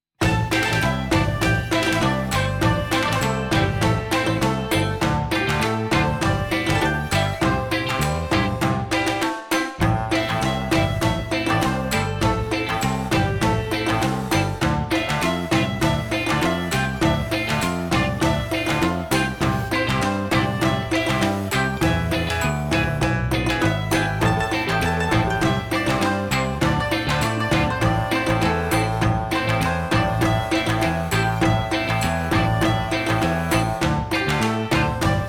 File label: inst